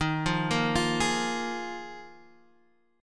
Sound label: Eb Suspended 2nd Suspended 4th, Guitar, Half Step Down